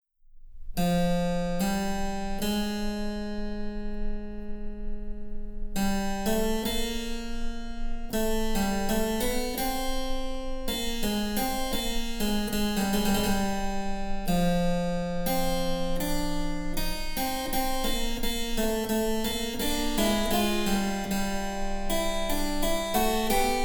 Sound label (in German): Cembalo; Mark Lindley WTC 1